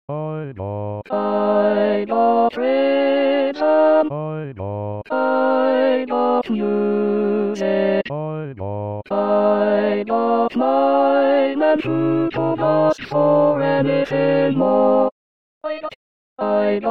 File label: - All